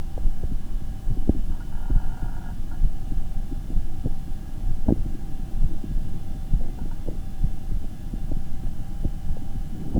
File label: lung